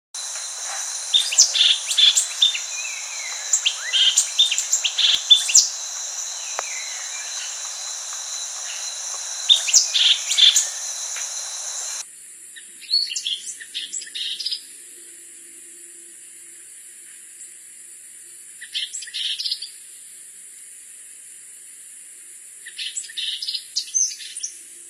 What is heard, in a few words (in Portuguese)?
canto do gaturamo olivaceo